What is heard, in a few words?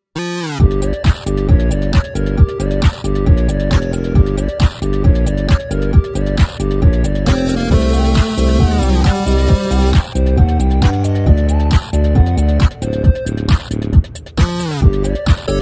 8 Bit